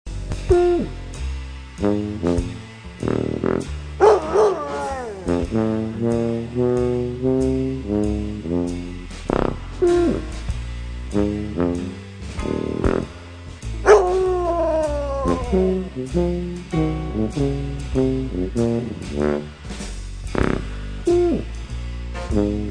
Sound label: blues